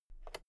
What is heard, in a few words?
fader single slide click